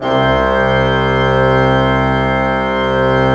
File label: C2, Cathedral Organ, PED.PLENO; Propeller Island